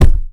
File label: Thud